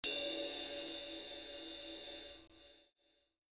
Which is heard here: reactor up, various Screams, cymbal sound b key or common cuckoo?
cymbal sound b key